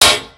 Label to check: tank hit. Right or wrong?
Right